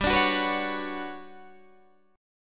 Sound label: G Major Sharp 11th G, Guitar Standard; GM#11 strummed